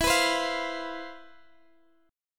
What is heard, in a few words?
C Major 11th E